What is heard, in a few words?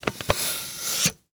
Technology Electronic Tripod Light Tripod Extending